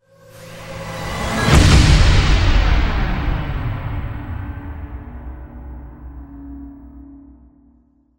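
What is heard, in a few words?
short accent; Акцент; Звук саспенса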